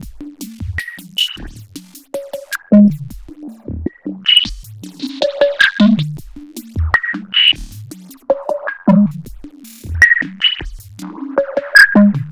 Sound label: Drums Strange